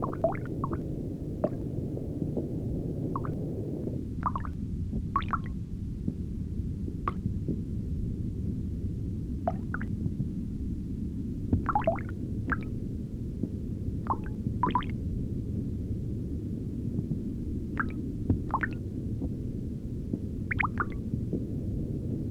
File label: water; pippi longstocking 3d nintendo 3ds gamerip